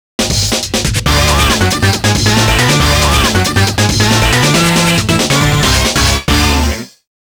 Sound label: Groove Rush